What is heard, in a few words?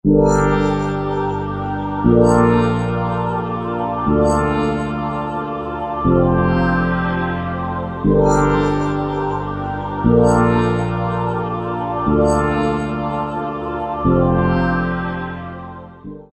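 guitar, E-mu PK-6; Seduced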